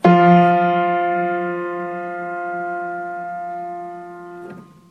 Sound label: F3 F4 median just octave, piano